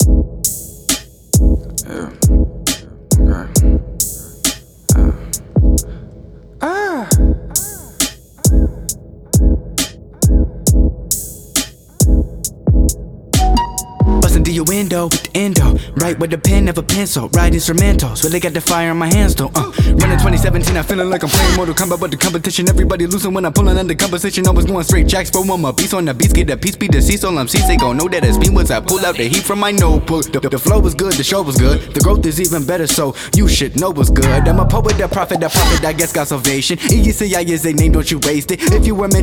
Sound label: Groove